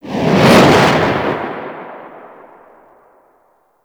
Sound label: Infested Golem Hand Swing; Warframe